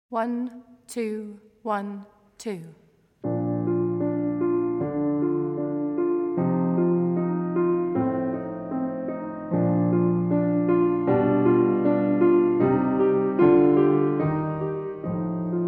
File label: piano only; Schubert; Entracte